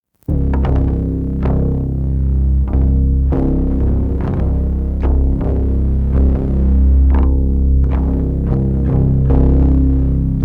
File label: bass